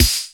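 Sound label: euro analog; Combo 1; E-MU Formula 4000 Series Vol. 2 – Techno Trance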